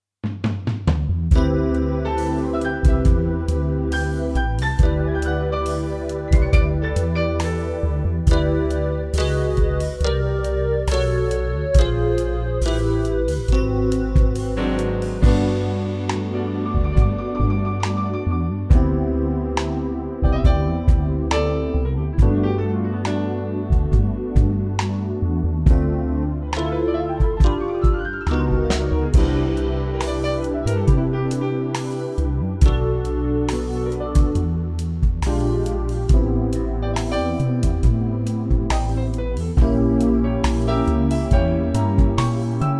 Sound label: smooth G